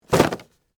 Cardboard Box Down, Foley, Cardboard, Box, Set, Down, Full; Sound Effects:Materials